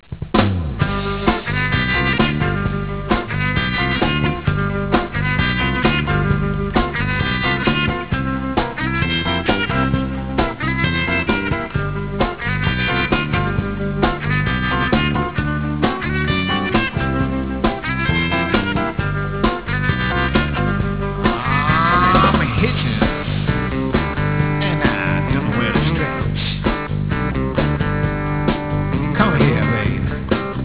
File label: scratch